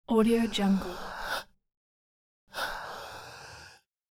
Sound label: deep airy breathing through mouth